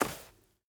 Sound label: stag footstep sand hard; Rust